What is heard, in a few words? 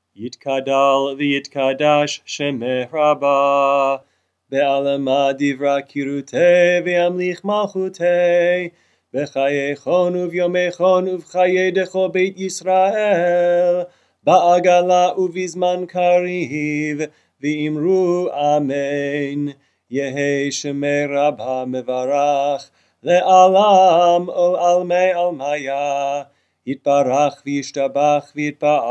chatzi kaddish weekday arvit; weekday evening